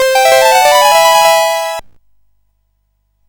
sid CSG8580R5, GAMES; Continental Circus

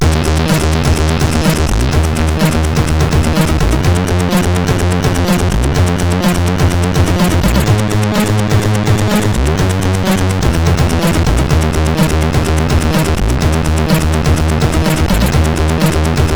Bass, sid CSG8580R5

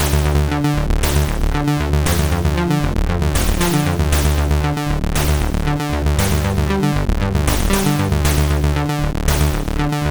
Party Song, sid MOS6581R4

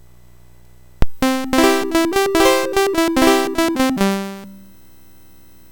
BASIC sid MOS6581R4, GAMES; Sword of Fargoal

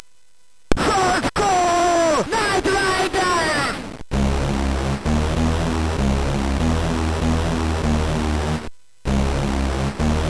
Mix, MOS6581R4

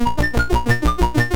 Chords BASIC, MOS 6581 R4; Galaga

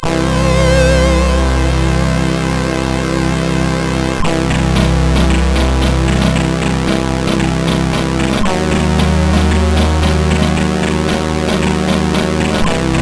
E-Load loader, CSG8580R5; hvsc

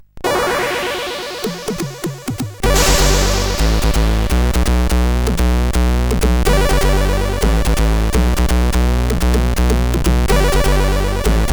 sid, CSG8580R5; hvsc

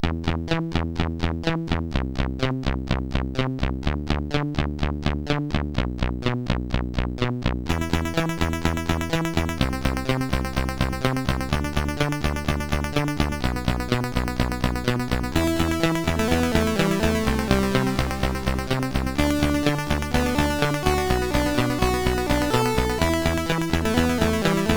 Game Musix, sid; CSG8580R5

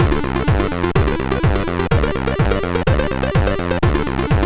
Race, sid, MOS6581R3

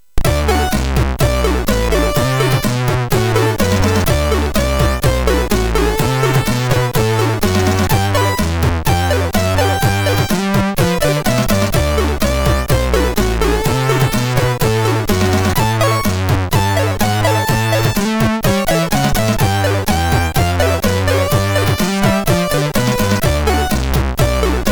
Blues, MOS6581; MOS6581R3; hvsc

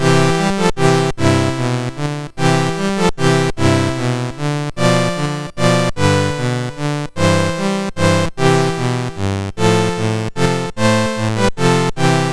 Silent Night, MOS6581R4

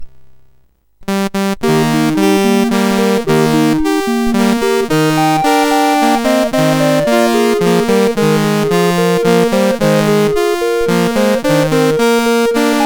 Cradle Song, sid CSG8580R5

